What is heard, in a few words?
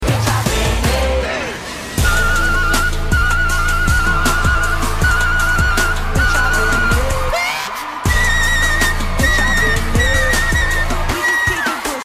flute solo